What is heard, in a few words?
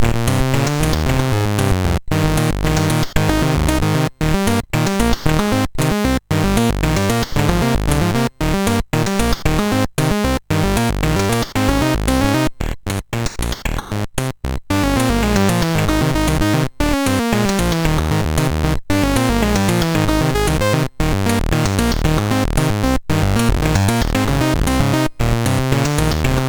sid MOS6581R4, GAMES; Break Dance